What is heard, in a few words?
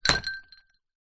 food drink glass ice liquid down hard table